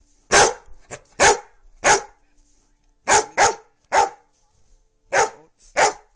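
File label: dog; chien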